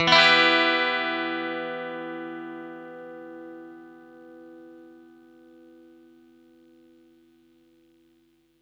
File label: clean chord Em, clean electric guitar chords